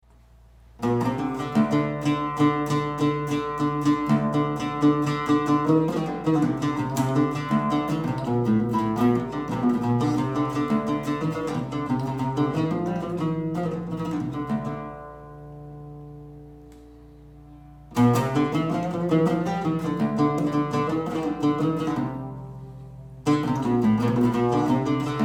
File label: Taqasim Oud